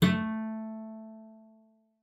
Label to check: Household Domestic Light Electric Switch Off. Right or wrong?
Wrong